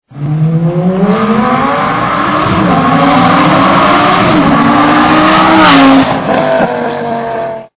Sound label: gallardo; Lambo